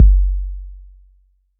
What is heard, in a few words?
Kick 808 Tone1; gate experiments